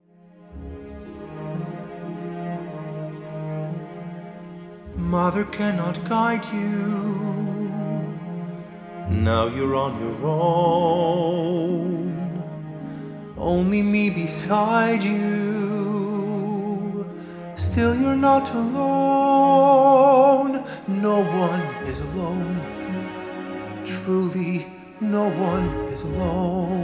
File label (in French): chant; AgenceBD